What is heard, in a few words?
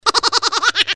incorrect